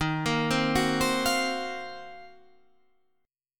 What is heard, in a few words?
D sharp Minor 6th Add 9th D sharp, chord